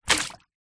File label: transport boat oar metal throw into water